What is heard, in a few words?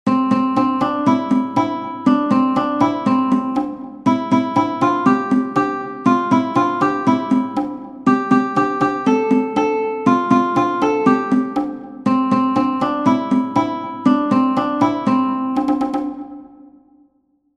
Cajon; Spannenlanger Hansel